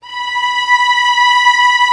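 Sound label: Vio legato B4; Digital Sound Factory Virtuoso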